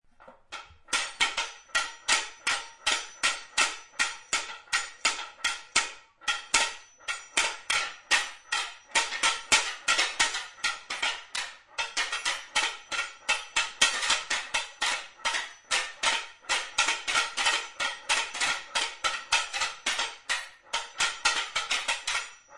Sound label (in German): klapper